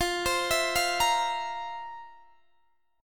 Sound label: F Major 7th Suspended 4th, Guitar, Open D